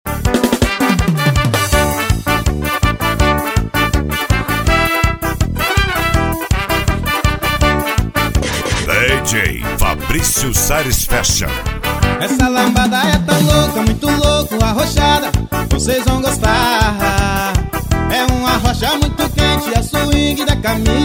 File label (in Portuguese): lambadao